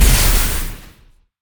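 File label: Electric Turret explosion; monster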